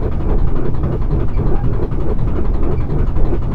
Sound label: wheel